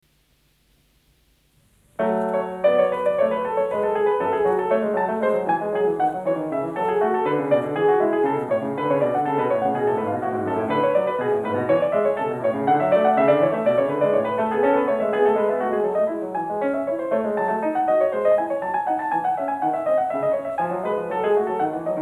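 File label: Prelude in F sharp minor, piano; Bach; WTC, Book 1; Prelude No. 14; BWV 859; FISCHER, Edwin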